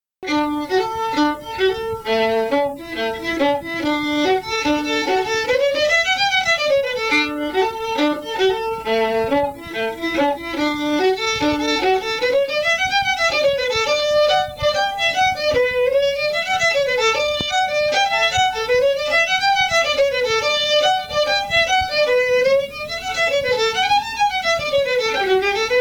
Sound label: fiddle; SeamusGrantFestival; tune tutor